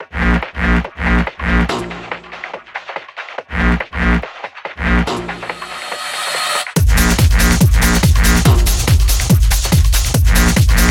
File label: hardschranzstyle